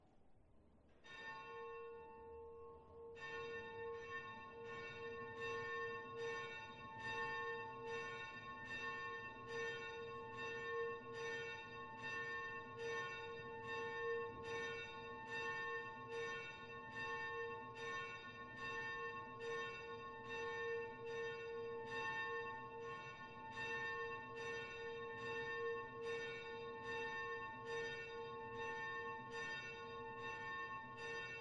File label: bell; southtower; michael